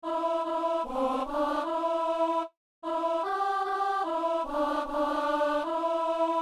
psaume alto; Psaume 92 (93); Messe du 17 janvier à Saint-Denis

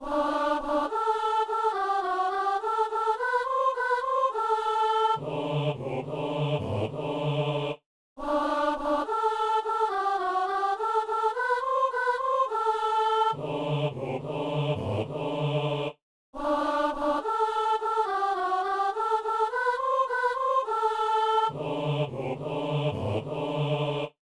soliste et basse

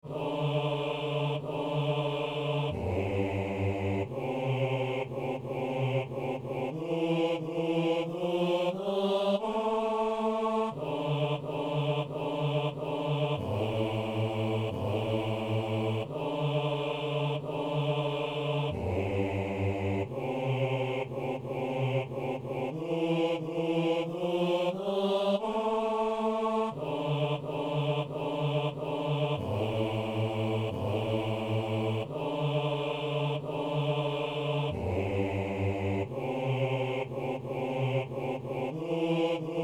messe agnus basse; messe de saint jean

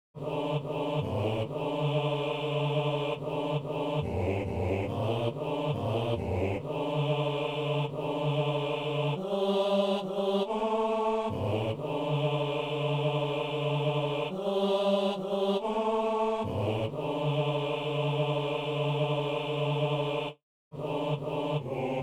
basse; Messe coutrillonne - Agnus

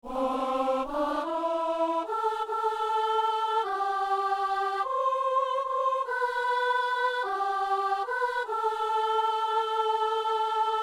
dieu qui fais merveille, soprano; Messe du 17 janvier à Saint-Denis

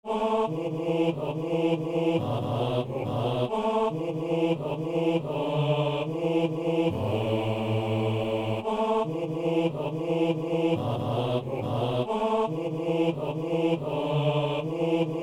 basse; Saint-Denis

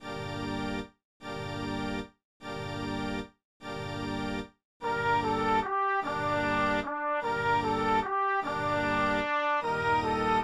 soliste et basse; a 220-1 homme au milieu des hommes

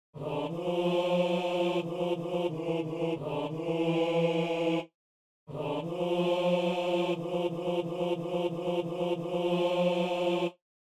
doxologie du canon soprano; Messe simple

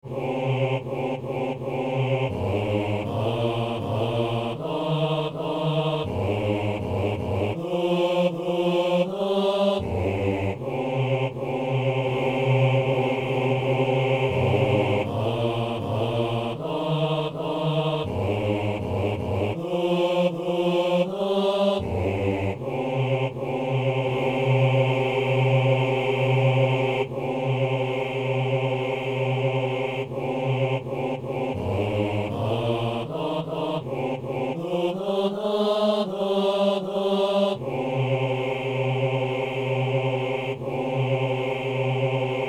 basse

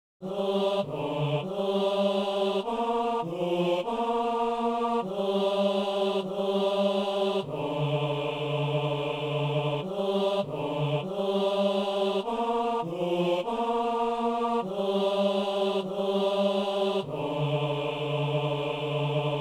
basse; Doxologie du canon; messe de san lorenzo

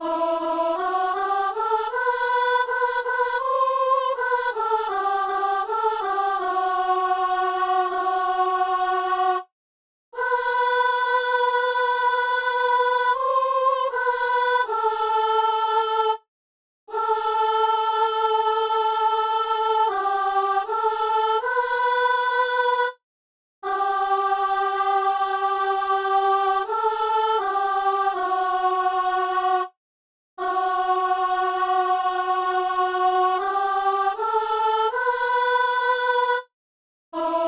psaume soprano; Messe du 17 janvier à Saint-Denis; Psaume 97 (98)

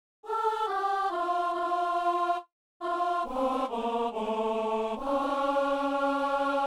exauce nous seigneur de gloire, alto; Saint-Denis

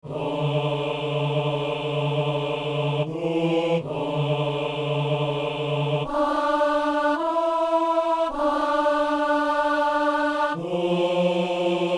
messe doxologie du canon soprano; Messe inaugurale

